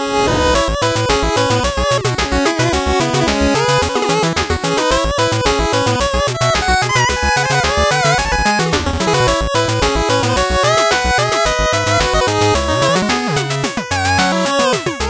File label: 8bit bgm slow